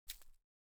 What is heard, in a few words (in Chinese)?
老鼠洞互动